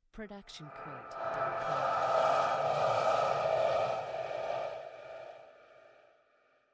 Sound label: alien whoosh